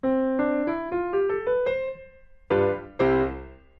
majeur

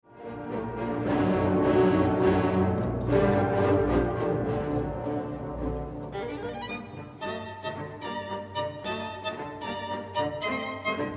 concerto violon; sibelius; postromantisme

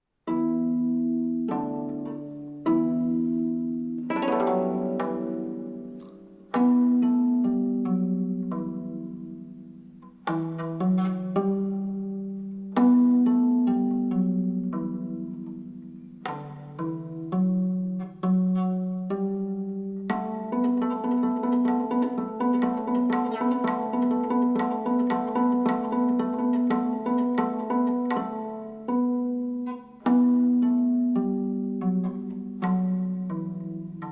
Lyre

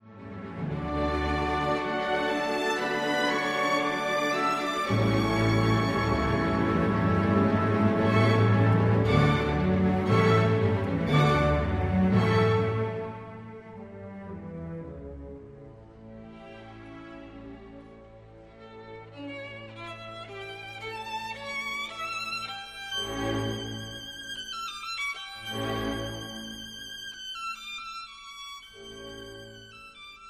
concerto violon; Beethoven

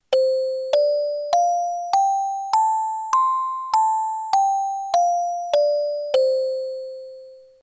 pentatonique

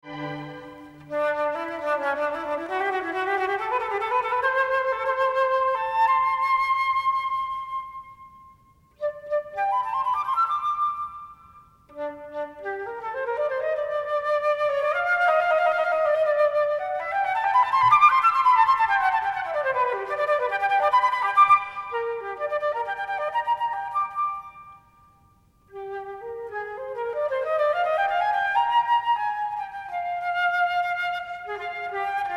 concerto flute; Mozart

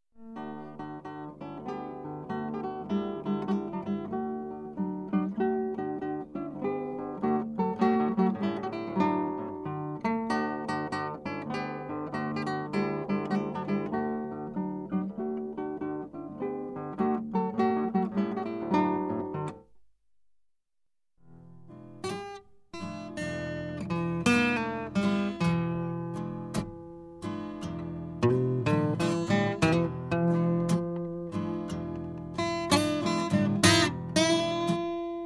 guitare

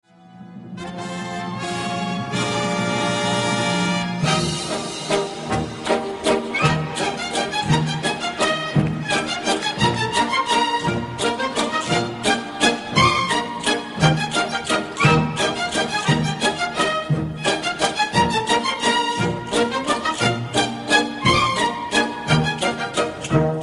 jota aragonnaise; glinka; postromantisme

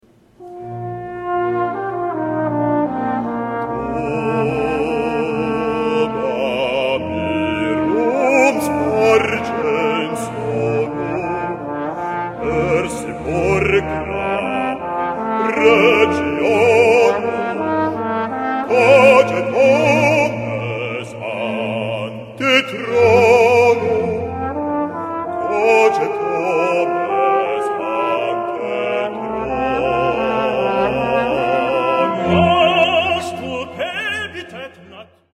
trombone; mozart

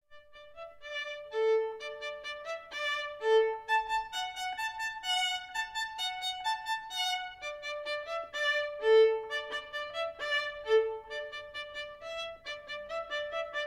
violon

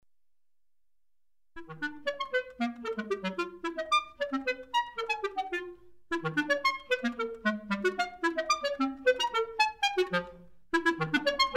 clarinette

